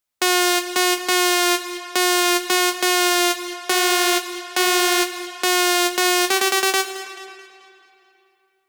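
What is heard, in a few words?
lead, Sylenth1; Breaking Bald; alonso 19 year anniversary pack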